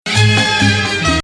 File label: Nahawand, maqam; Rast